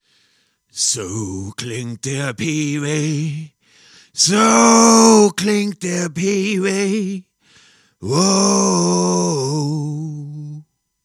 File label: SM58; Peavy